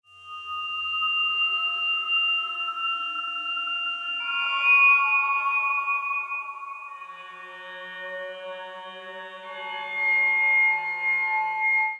Texture 80 Bpm; MIDI Clips; Instruments; Silent Night; Ableton; Drone Lab

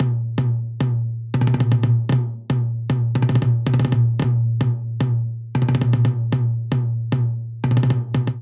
Polyrhythmic Dance High Tom Only 114 bpm; MIDI Clips; Ableton; Factory Packs; Drum Booth

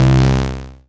Third Bass, Analog Bass; Ableton; Factory Packs; Retro Synths; Analog Bass Vol 1

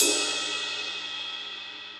Ride Classic Muffle; Drums; Drum Hits; Ableton; Session Drums Studio; MPE Hits

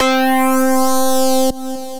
Asynchronous Lead, Synth Lead

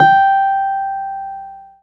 Model-C BD G4; Keyboards; Ableton Foundation FreePacks

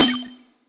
Breaker snare tweak; Ableton Foundation FreePacks; Wicked Kits

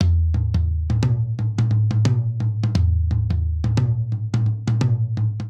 Rolling Hip Hop Toms Only 87 bpm; 4 4; MIDI Clips; Ableton; Factory Packs; Drum Booth

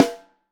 Top, Snare

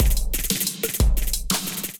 Feel Right Kit, Drums; Ableton; Drive and Glow

